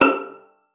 VIOLIN; Xsample VOL-5; 90 s Sample CDs; VIOLIN FING

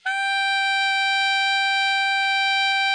ALTO MF G 4, SAX; Greytsounds; Philip Wolfe David Crozier's Saxophones

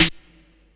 SD HOU, Dance Mega Drums; Best Service

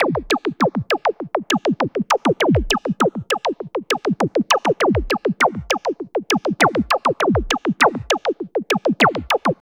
LOOP, Tekno Industrial; East West

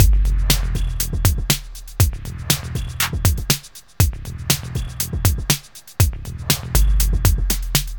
Elektro, Processed Percussion

